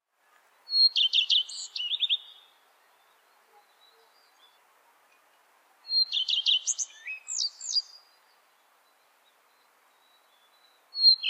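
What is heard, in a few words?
common redstart voice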